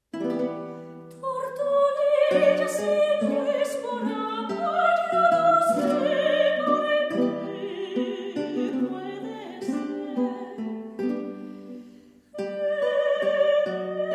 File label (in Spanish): barroca